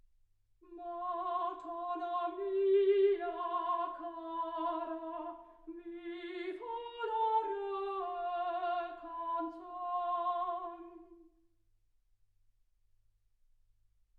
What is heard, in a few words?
soprano